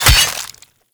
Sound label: Melee Axe Impact Flesh; Axes